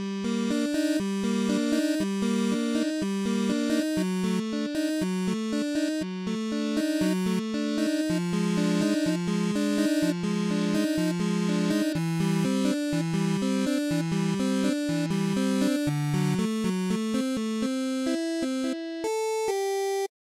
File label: Eight Bit